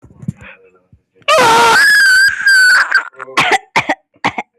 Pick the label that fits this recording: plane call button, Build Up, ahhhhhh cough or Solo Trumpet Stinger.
ahhhhhh cough